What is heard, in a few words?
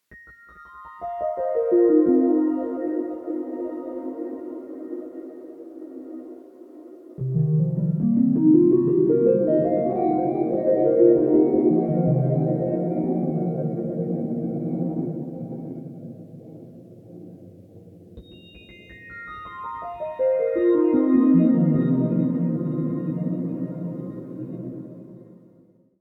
Space Keys